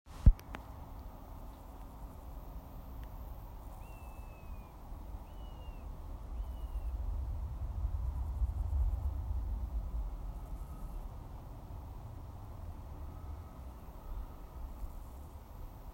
Distant Buzzard

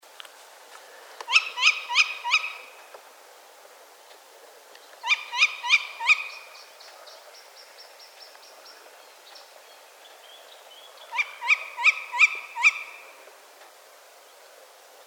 Tawny Owl Strix aluco

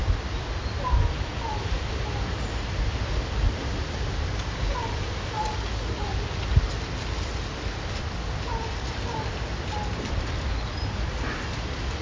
Mystery bird

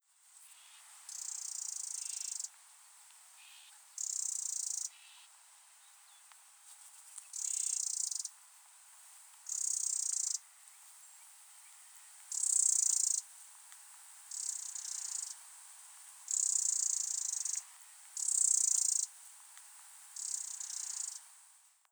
Eurasian Wren Troglodytes troglodytes

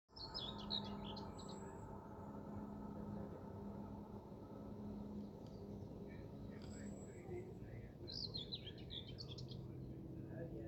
Bird

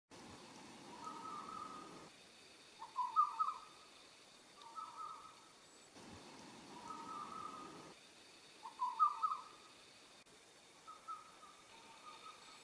night bird

